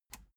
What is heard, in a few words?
plastic button latch loose release enable